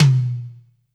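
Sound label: tom, Casio CTK-5000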